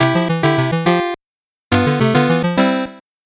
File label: 8bit jingle slow